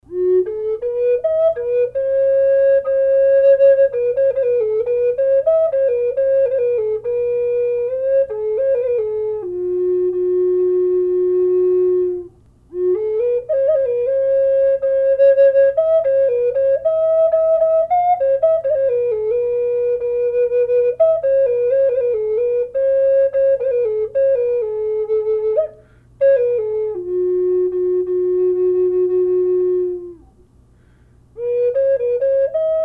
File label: jaguar f sharp, flute